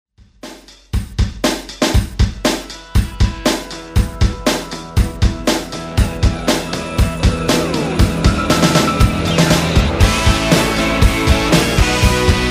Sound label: Country Redrum